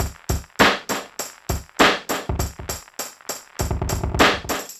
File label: drloop, drumloops, Vinyl Attack; Masterbits